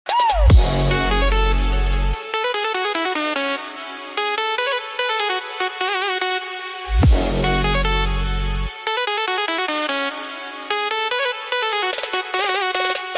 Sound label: famous mozarts turkish march; east valley music